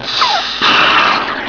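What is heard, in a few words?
soda bottle; Web Clip Empire 150,000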